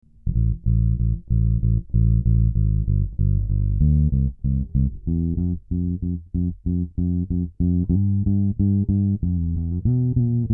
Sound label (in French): Doigts Tona Off